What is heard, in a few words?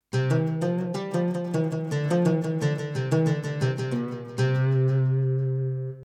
Aoud; Arabische Instrumente